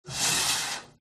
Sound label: open door medicine cabinet; fox audio